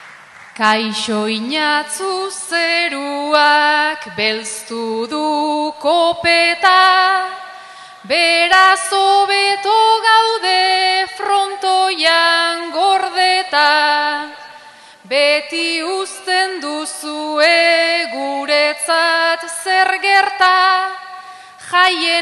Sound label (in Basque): agurra, bertsoa; erenotzu